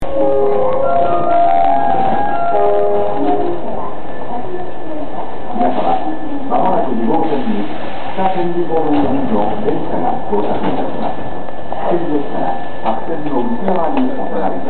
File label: Jingle Train; Japon